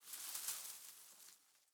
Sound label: leaf rustle grassy med